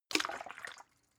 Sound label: bottle water upend, gastronomie; berufe